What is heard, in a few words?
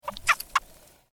mazama pocket gopher